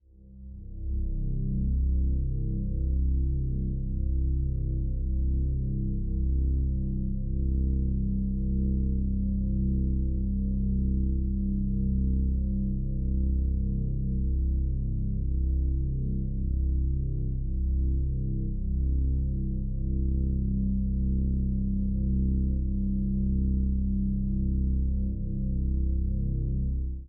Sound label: Drone; Get Even